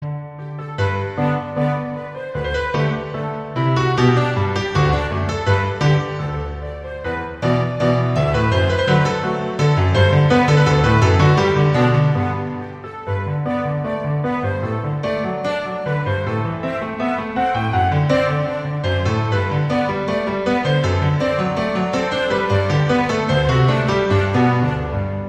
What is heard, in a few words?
Orchestral Version